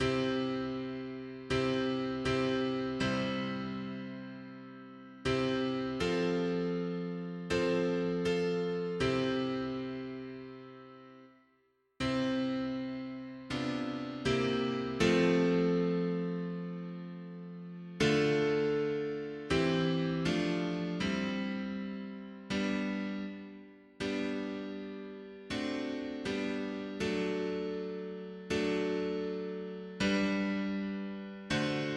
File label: mono piano, hymns; Agneau de Dieu par tes douleurs